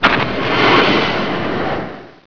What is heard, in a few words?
flare start